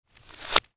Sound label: CARTOON